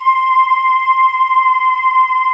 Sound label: C5, Choir Acoustic & Synth; USB Soundscan vol.28 - Choir Acoustic & Synth; AKAI